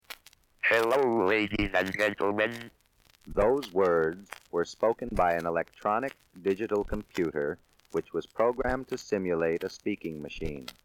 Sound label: bell synth